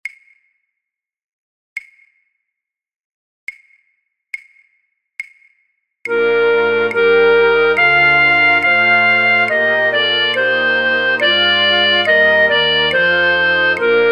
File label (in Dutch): fluitorkest, Fluit; Matthaus Passion